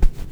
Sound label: step; poppf female pianosaur; critters